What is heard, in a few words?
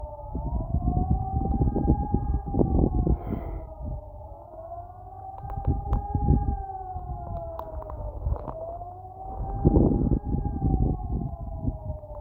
alarm test